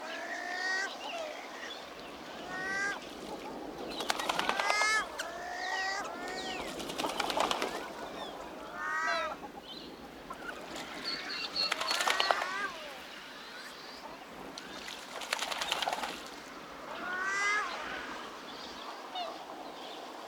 ducks in lake; Golden Crayon Sounds

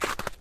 snow step; Golden Crayon Sounds

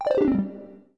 browse Mode; NVDA; Random NVDA Sound Scheme

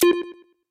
chat; Original TK Sounds

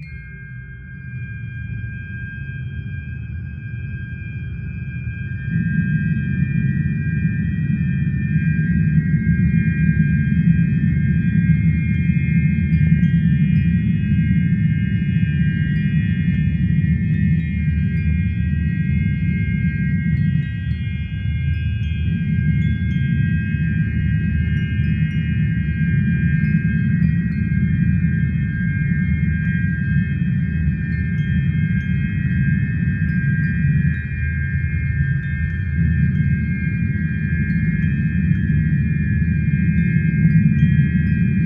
musicbox epic; Manamon Music; Manamon 2

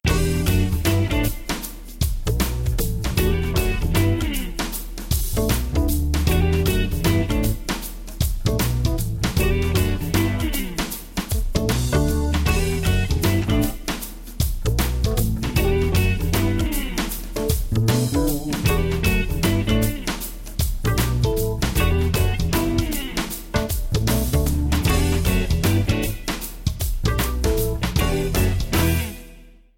main music; RS Games Sounds; Yahtzee

